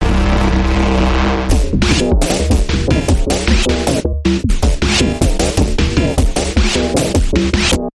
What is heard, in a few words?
Buzz Cut Electronic Bumper